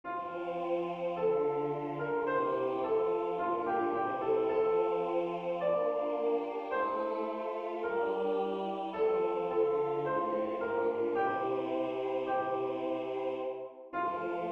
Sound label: soprano tutti